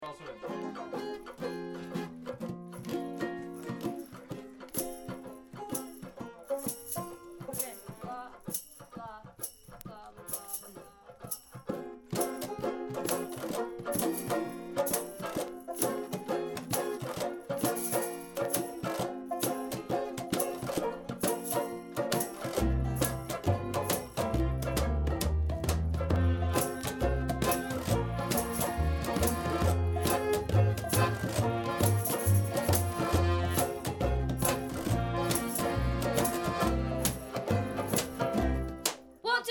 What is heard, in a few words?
music box; the music box